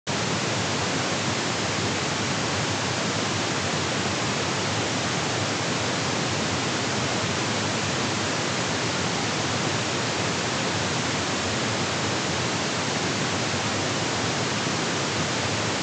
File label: Reference Tones Band-limited pink noise; Surround Spectacular